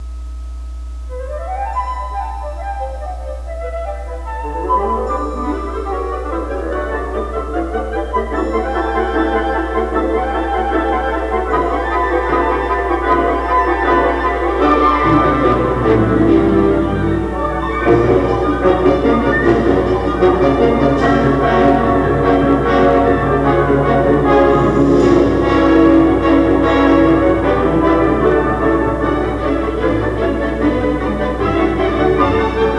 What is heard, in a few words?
Symphony in A Minor